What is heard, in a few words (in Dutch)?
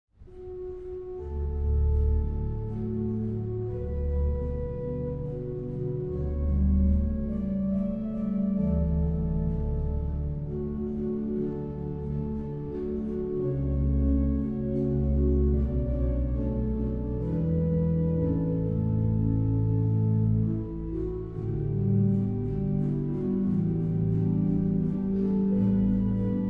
Improvisatie (hoofdorgel); Gute abend gut nacht